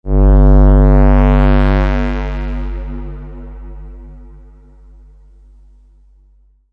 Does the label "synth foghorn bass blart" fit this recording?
Yes